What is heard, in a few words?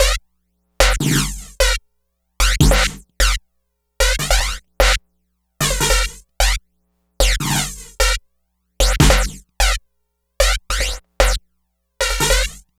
Drums & Percussion, Electronic; Sonic Foundry (Sony Creative Software); Electronic Point Blank; Electronica